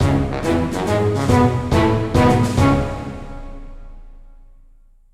sfx war victory; Zen Pinball Party; Castle Storm

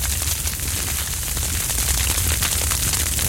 Carburant Burst; Puddle

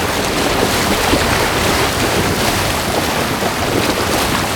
Amb Shark thrash; Kelly Slater's Pro Surfer

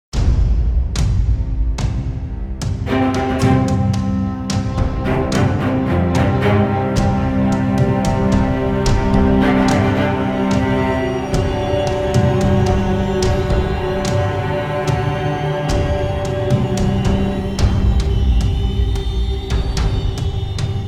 Dungeon Cave Battle; risen 2 dark waters re-engineered soundtrack 2012

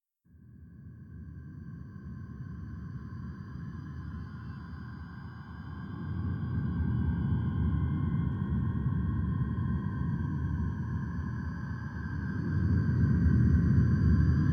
Xeno Ambient; Junk Jack

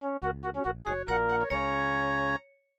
MIDI Animation stings

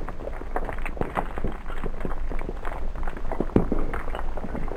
Rumble Rock Loop

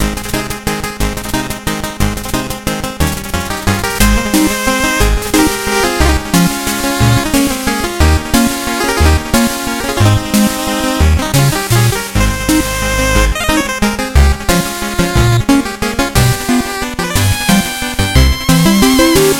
Music Dance Short

